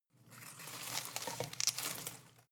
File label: home plant leaves rustle rattle short